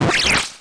die; tirfing

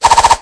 arrowstorm

